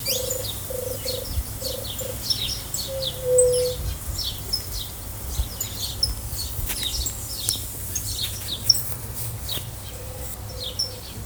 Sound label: white tipped dove territorial call